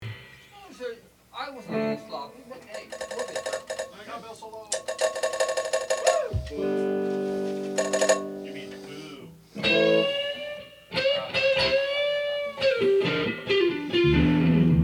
Vocals and Background

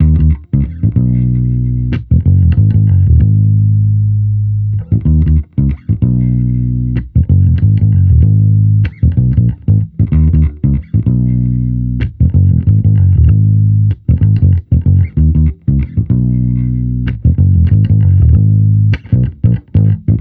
Amped loop 95 Abm, Electric Bass Loops; Slate Digital BASSHOLE Sample Pack

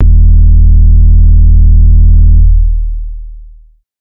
808 one shot Eb; Slate Digital BASSHOLE Sample Pack

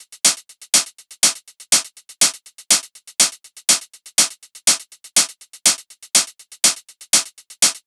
hats 122, Hat Loops; drum sample pack; Ioto; SEISMIC; Slate

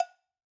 cowbell Single Hit; Raw Perc One Shots; Slate; SD SEISMIC Drum Sample Pack

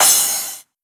Cymbal, 909; Slate; Vintage Drum Machines Volume 3 909 Edition

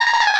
vocal Abm; ebbing; SD AURORA Sample Pack; Slate

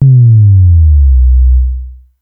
Synth oneshot Sub Sweep Down, Synth Bass; Slate Digital BASSHOLE Sample Pack

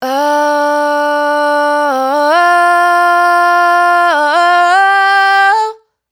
riff Long Run Woah 105 C, Raw Vocals, Dry Vocals; Slate Digital Omnivox Vocal Sample Pack

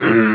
vocal deep A; Slate; AURORA Sample Pack; Vocals

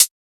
hat, drum sample; Hats

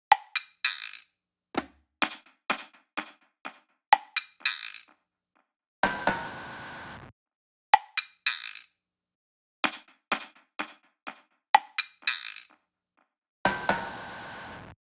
perc loop 63, Pop, Drums; Loops; Slate; SD PASADENA Pop Sample Pack

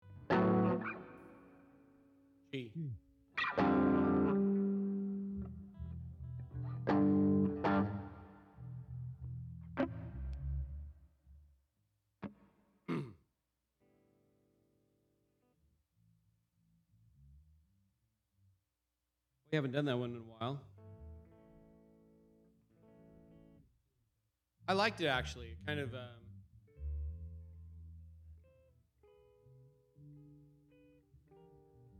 Vocal Emphasis Mix

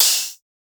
hat, drum sample; Drum Sample Pack; One Shots; Hats; fine; Slate; SEISMIC

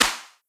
clap fizz, Hip-Hop; Drum One Shots; SD CAICOS Hip-Hop Sample Pack; Slate

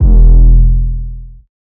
808 Bass Rev E3; Slate 808 Deep Dive; SD Signature 808s

